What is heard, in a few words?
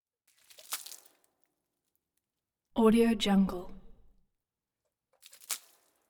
basement splash